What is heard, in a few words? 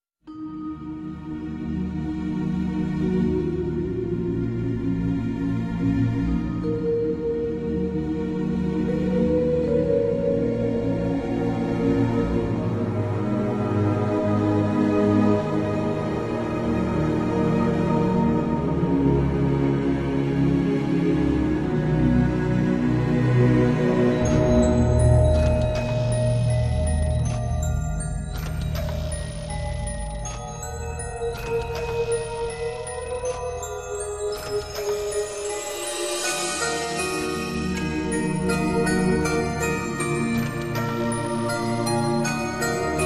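Flying On The Wings Of Steam (orchestral) remix; American McGee's Alice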